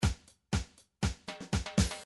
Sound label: midi drums tango